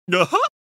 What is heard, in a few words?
guh huh banjo